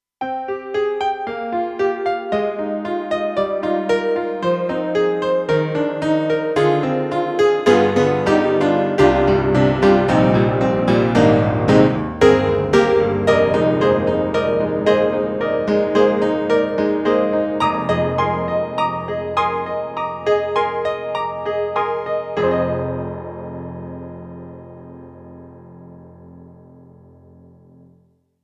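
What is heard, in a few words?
Roland SC-8850 Honky tonk, piano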